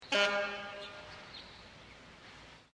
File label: Short Ab G sax w bird